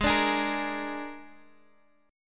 Ab Suspended 2nd Flat 5th Ab Guitar Half Step Down